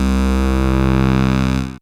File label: bass C, Rave Bass, Dance Synths